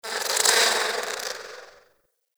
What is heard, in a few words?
Clan Dojo Garden Fly; Warframe; Garden Animals